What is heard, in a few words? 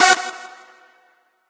lead